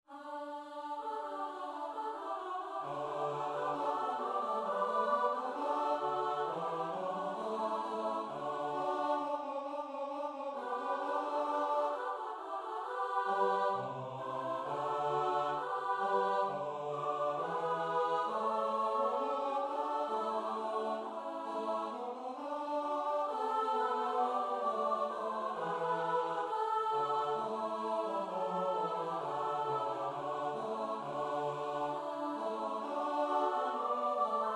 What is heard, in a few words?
midi the nightingale; trzyglosowe; weelkes; Biblioteka Utworów Chóralnych przygotowana przez Towarzystwo Muzyczne im. Edwina Kowalika